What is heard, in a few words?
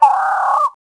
Homa Call, Dino